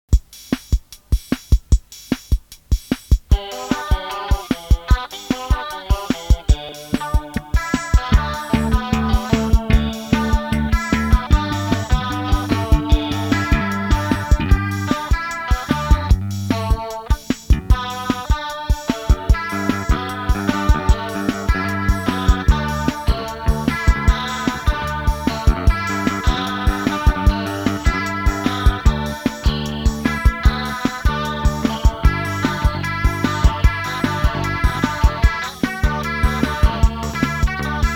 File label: instrumental